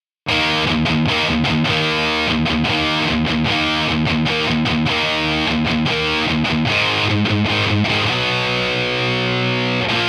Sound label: Blue Crunchy; JupiterXTC